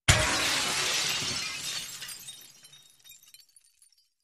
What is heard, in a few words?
Glass Crashes Large W; windows & mirrors